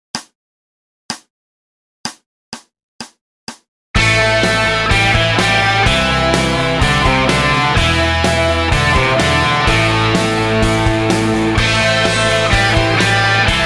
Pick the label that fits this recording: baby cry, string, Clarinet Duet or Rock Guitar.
Rock Guitar